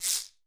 Scrape It; Alesis D4; Efx

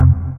DM ORG1, Dance Mega Synth

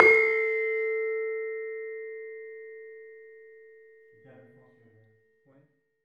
LAMEL A3 -L; USB Soundscan vol.60; AKAI; LAMELOFO

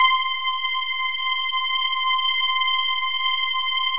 LEADS; Masterbits; Vintage Voltage

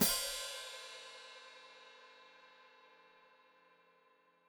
Hat Closed Open, Hi-Hat

